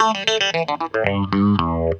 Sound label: PICK GTR; USB Soundscan vol.32; Roots Disco; AKAI